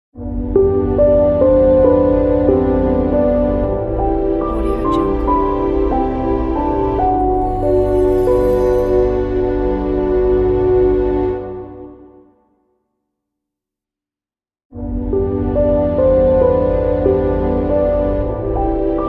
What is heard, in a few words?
soft logo; ilovemedia-es